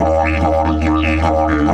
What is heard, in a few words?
EF 140, DIDGE RIFFS